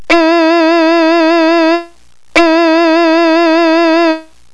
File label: Two vibrato's with different endings.